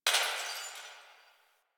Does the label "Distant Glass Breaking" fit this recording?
Yes